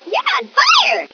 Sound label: on fire